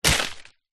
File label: tree leaves branch thin hit; foley; zapsplat